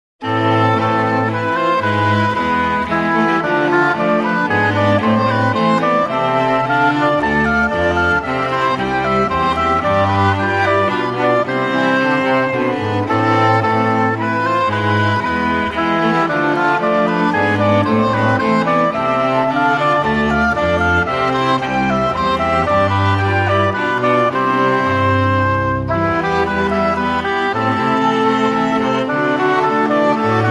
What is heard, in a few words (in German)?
Menuett